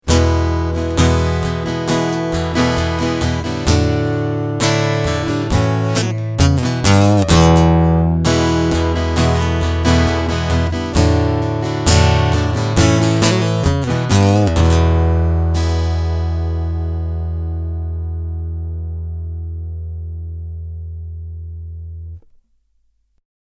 Strumming EQ Mittenposition

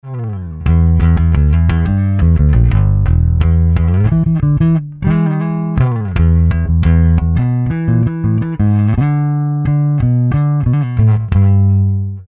Badewanne Bass DI

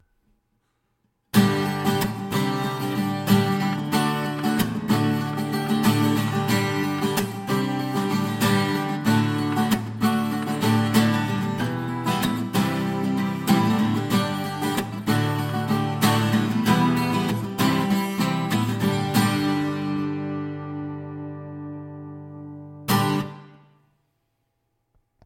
Akustische Gitarre Strumming

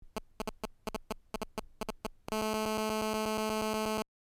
Funktest iPhone 50cm WA-84

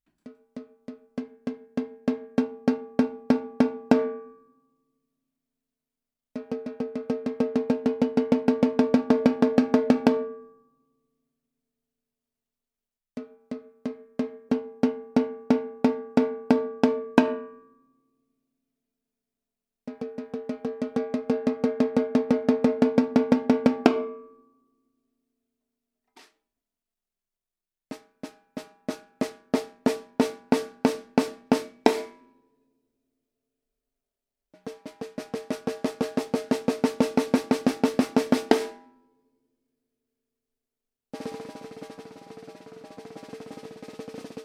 14x65 Oak Snare Recordings Hoch

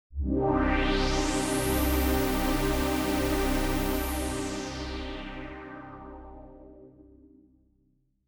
12dB Waldorf Filter Resonanz

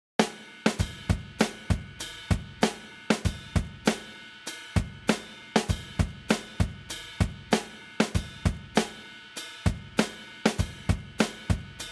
Eventide SP2016 Drums und PreDelay Delay

